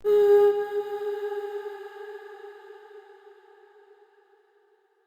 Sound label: Statue Voice G Sharp; Player Instrument; Statues